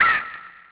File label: amb bird; Tony Hawk's Project 8; XBOX